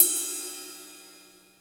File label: Ride; Drums; Ableton Live 12 Lite Core Library